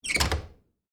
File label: Door Knob Turn, Foley, Door, Knob, Turn, Hard, Wood, Bathroom; SoundEffects-BlastWave